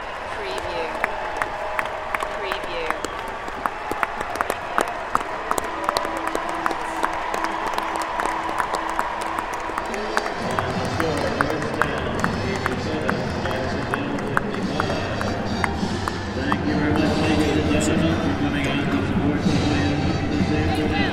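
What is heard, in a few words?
Arena Crowd Applause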